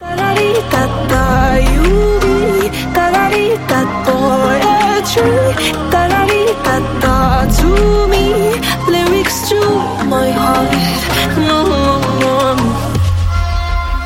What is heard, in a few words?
slowed reverbed